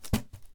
Throwing; Phasmophobia

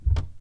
Underneath stairs footsteps; Phasmophobia

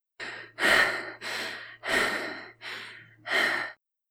Female Breathing; Phasmophobia

